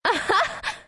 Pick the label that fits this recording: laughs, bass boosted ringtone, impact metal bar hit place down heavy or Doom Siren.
laughs